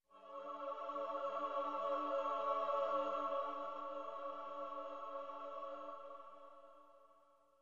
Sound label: Holy choir sound effect